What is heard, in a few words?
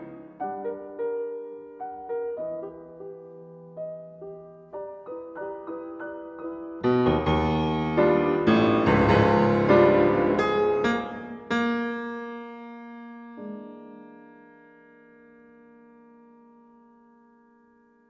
Piano Sonata; Viktor Ullmann